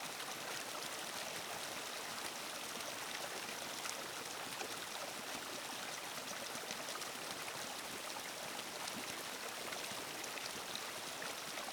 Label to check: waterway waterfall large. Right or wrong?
Right